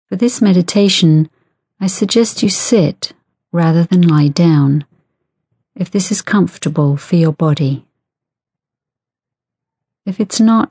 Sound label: crying meditation, laughter and tears; lunchtime laughter and tears